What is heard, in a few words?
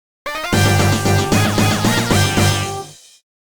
Summon Music; YuGiOh Over the Nexus